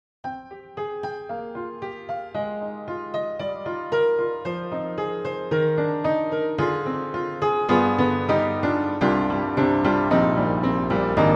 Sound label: Upright Pianos Vintage Upright Vintage Balladeer; Synthogy; Ivory II